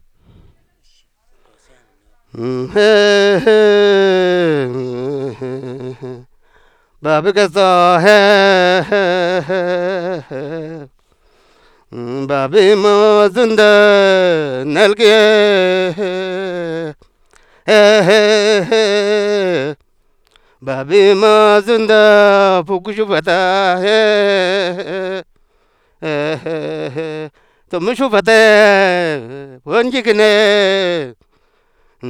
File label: chant